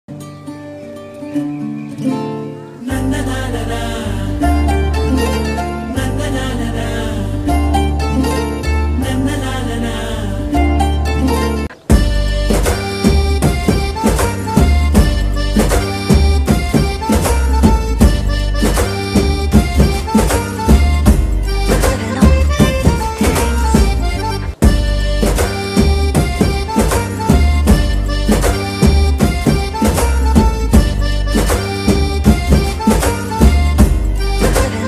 love letter bgm marriage band love ringtone telugu; ambajipeta